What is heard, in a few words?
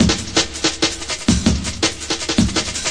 164 oldskool rolling it, drum loops and breaks; 161 to 180 bpm